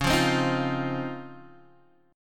C sharp Major 9th, Guitar, Open G

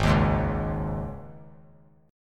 G sharp Suspended 4th G sharp; G#sus4 strummed; JGuitar

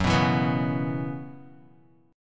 Gb 7th Suspended 4th Gb down Guitar, chord; Gb7sus4; strummed; JGuitar

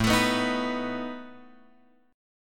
A Suspended 2nd Flat 5th A, guitar; Asus2b5 Chord {5 X 7 4 4 7} strummed

